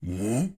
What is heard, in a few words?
zombie guard awake